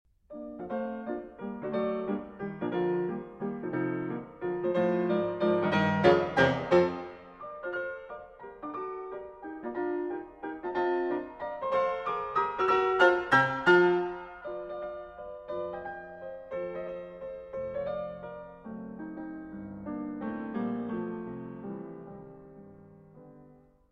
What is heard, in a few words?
Piano Sonata B-Flat Major - Scherzo - Assai Vivace